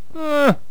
archer die; megaglest

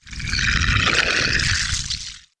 dragon ack; megaglest

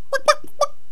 chicken ack; megaglest

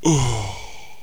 die; megaglest

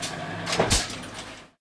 machine attack; megaglest

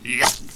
spearman attack; megaglest

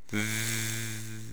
bee; megaglest

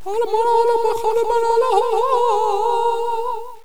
minarett; megaglest

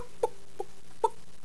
chicken standing; megaglest

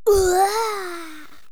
genie die; megaglest

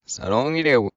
swordman ack; megaglest

